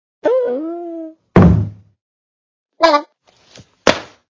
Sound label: Football head poke fall